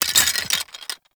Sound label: Bone Effigy Destroy; Gameplay; Warframe; Tau; Destructible Dynamic